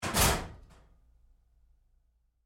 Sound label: Door Locker Close Roomy Lite, Metal Door; Doors